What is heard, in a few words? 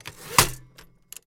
Typewriter Carriag; typewriters